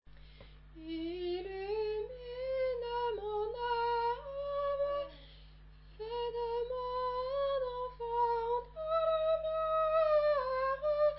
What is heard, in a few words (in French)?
Couplet 3 femmme; qui regarde vers Lui